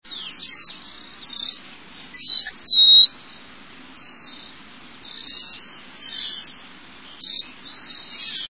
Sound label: Cedar Waxwing